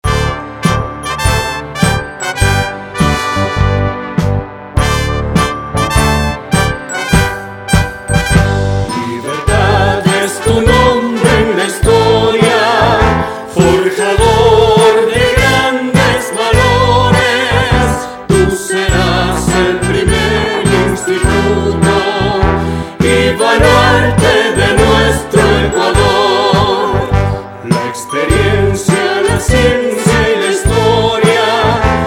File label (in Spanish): Himno; ISTUL